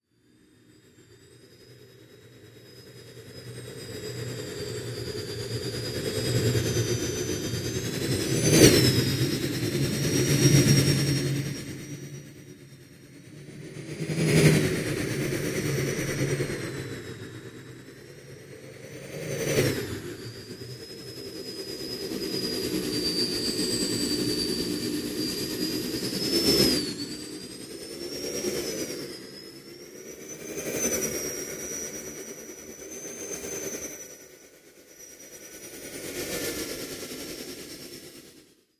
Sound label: Sci Fi Hovercraft Flyin, spaceship fx